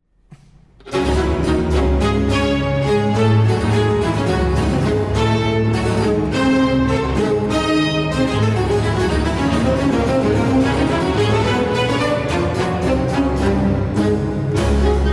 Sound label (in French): Concerto pour violon en re mineur; Johann Sebastian Bach; BWV 1052R